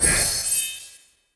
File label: magic sweep game trophy; mixkit